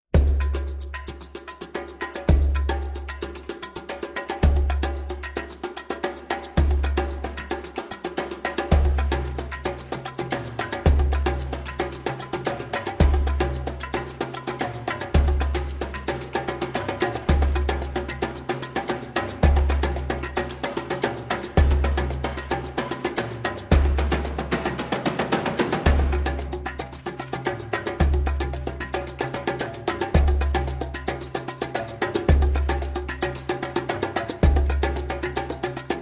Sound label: No Vocals